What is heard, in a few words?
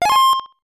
Coin; Hisshou 777 Fighter; SNES